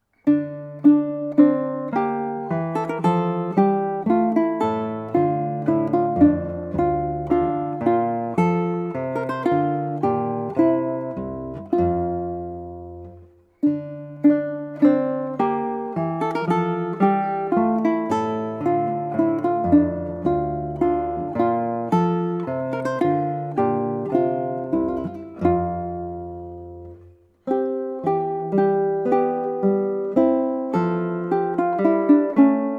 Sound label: Aria, Guitar and Ukulele; BWV 515; JS Bach